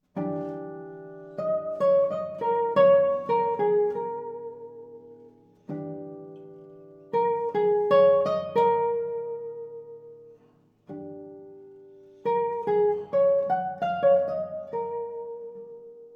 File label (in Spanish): Preludios epigramaticos